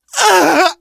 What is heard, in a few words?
hurt major, survivor voice, manager; source engine; left 4 dead 2